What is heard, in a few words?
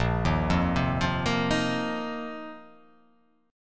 A sharp Suspended 2nd Suspended 4th A sharp